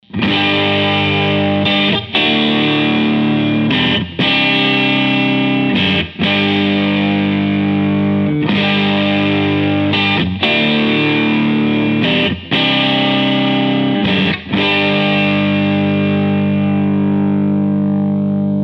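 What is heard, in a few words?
prs both clean rhythm; prs22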